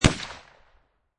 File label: Pistol Rhuger 357 Single Shot Exterior At 45'; Pistol Sound Effects; Gun / Pistol: Rhuger 357, Single shot, Exterior, at 45 feet distance